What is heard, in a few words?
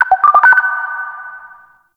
Interior Orbital Station Telemetry Mid